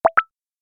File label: notification example; Fooocus